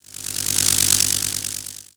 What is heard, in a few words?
Magnetized Random; Warframe